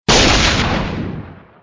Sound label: eagle blast; Angry Birds Space